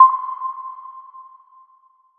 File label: Ui Continue Countdown